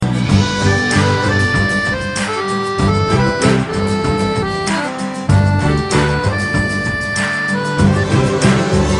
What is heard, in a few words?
motor piano; ringtones; sms; hero